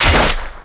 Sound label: comodo damage